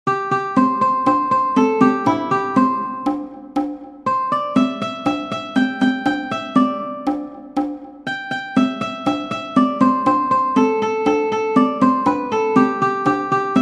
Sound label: Cajon; Hab ne Tante aus Marokko